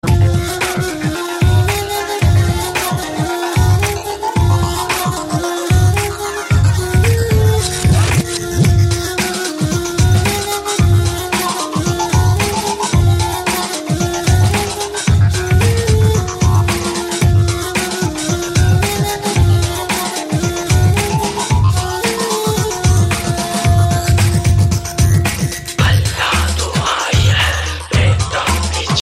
race indian ringtone

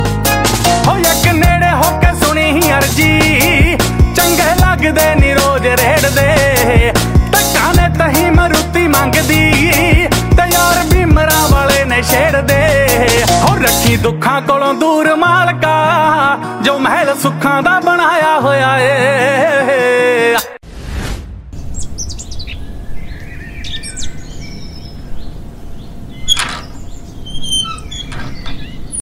punjabi sweet ringtone

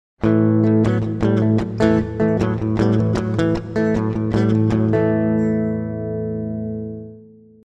electric guitar strings music tone ringtone

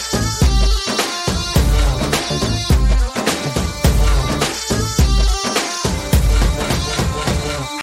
jazz music ringtone

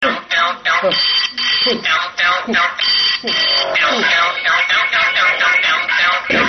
lound sound background music tone ringtone

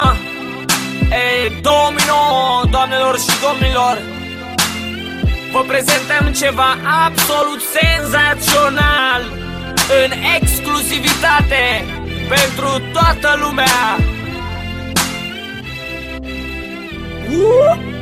top classical background music song tone

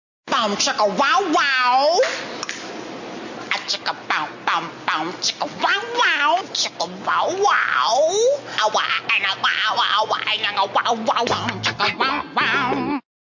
bom chicka wah wah cute message tone

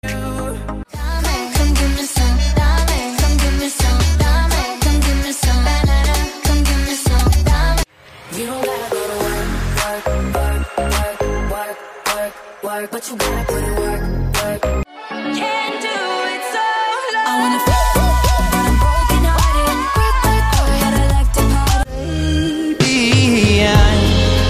english beautiful ringtone

